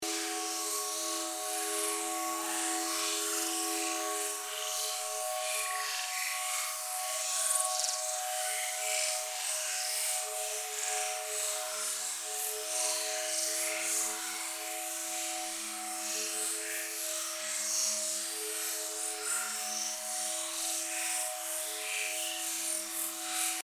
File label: Glacier 8x timestretch resonance amplified; northern vibrations